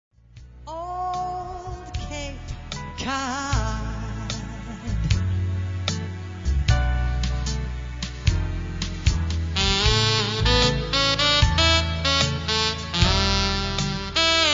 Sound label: sax; capecodsax